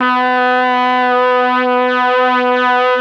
BRASS; ILIO; Propeller Island Legendary M400

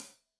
Hat Loose, Hi-Hat, Cymbals Stereo

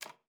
C#4, alto sax; Xsample VOL-2

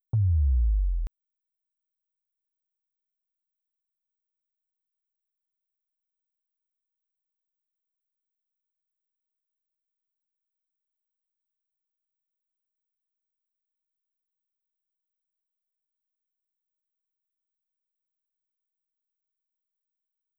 Drum Loop Sub Sweep A#, 128BPM; VEC5; Multi Loops

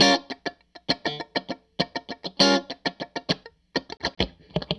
Strat Guitar Loop

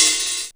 HIHAT OPEN; Back In Time Records; Korg Universe VOL-1; DRUM FAMILY

